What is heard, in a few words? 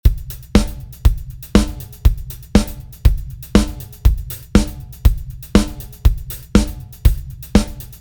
drums bad